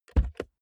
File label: car bmw e46 interior door front open fast; Cars